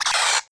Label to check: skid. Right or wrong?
Right